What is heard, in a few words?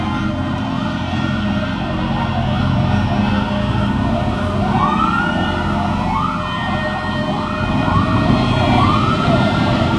siren; Множество сирен